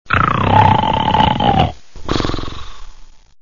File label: snore